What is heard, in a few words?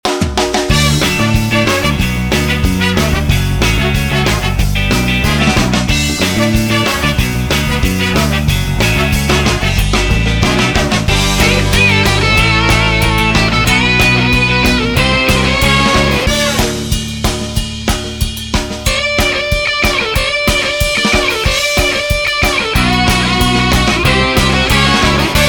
Dreadlocks And Brass